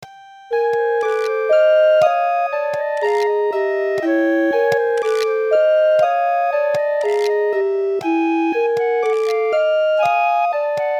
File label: MIDI ditty